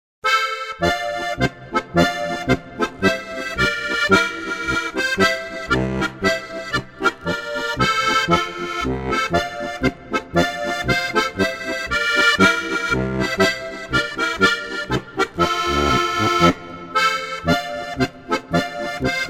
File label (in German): polka